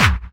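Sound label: snare drum bass drum, Dance Mega Drums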